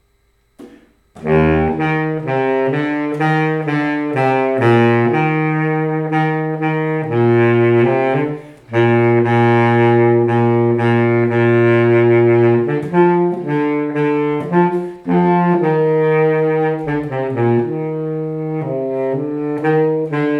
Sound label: Bari Sax; Sharpshooters; 8th grade all state